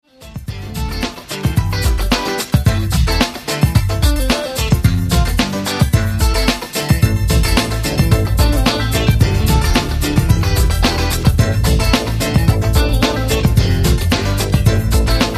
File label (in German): Playback zu Lied